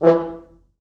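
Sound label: 4 French Horns, Horns; East West; Quantum Leap Horns Sax; 4 FrHorns MOD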